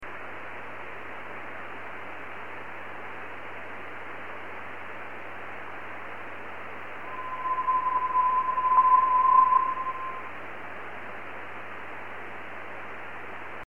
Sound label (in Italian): asteroide meteor scatter traccia audio; Traccia audio meteor scatter ASTEROIDE 2015 HD1; 07/03/2015 Pradespin di Lendinara (RO); analisi spettrale; Lat. 45,11544; asteroide 2015 HD1, previsto per le ore 10.10 circa (ora locale) ad una distanza di circa 75000 Km